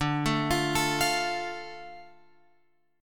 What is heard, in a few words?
D Minor D Guitar Open F; arpeggiated; JGuitar